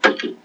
3.5 drive eject; pocket calculator 24

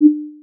bloop 32khz

